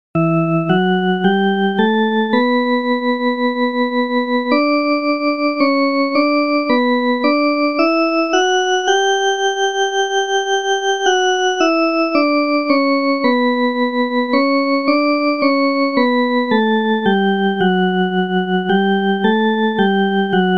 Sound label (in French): Baryton